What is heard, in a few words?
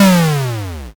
explosion l